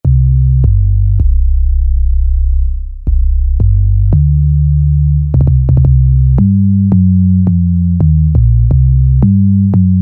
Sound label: Jungle Bass